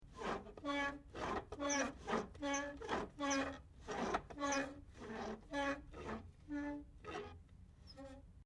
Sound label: Shutter